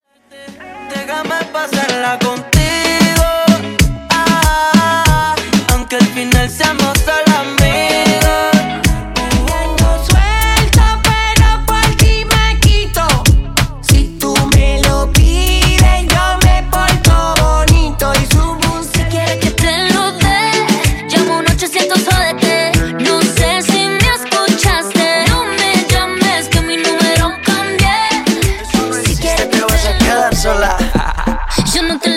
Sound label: Party Reggaeton Megamix, 95 Bpm